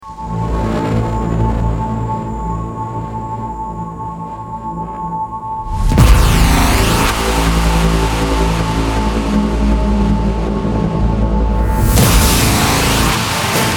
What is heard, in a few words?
no choir